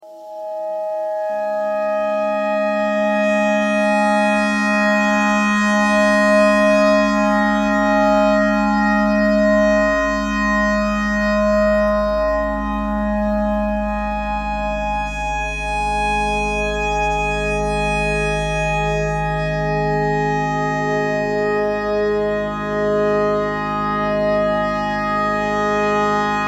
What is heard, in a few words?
clarinet clarinet notes e simple all